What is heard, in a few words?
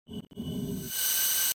drum anacrusis